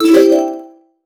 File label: menu ui select sound